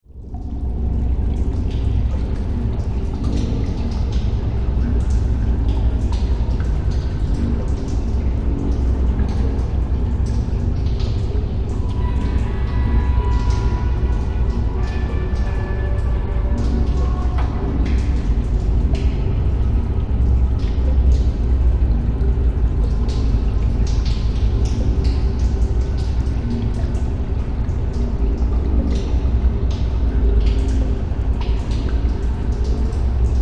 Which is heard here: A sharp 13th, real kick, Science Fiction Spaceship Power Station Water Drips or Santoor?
Science Fiction Spaceship Power Station Water Drips